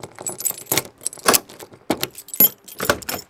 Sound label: Auto Door Unlock; Automobiles